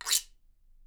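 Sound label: guiro, Percussion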